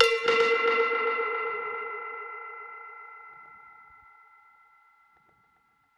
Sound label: Perc Hit, dub percussion one shots; musicradar